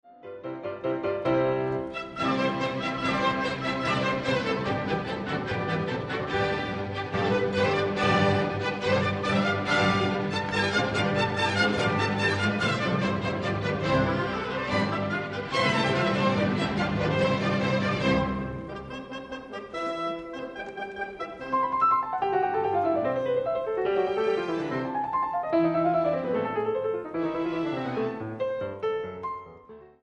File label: Piano Concerto in C major, Rondo. Allegro scherzando